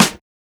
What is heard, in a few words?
Snare; FLPRO; Snares